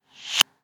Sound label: Click